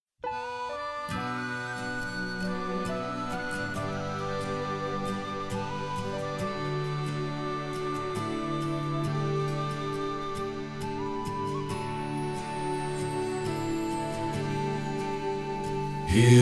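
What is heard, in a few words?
bass, Choir Rehearsal Parts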